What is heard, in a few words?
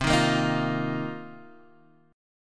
A sharp Suspended 2nd Suspended 4th C; strummed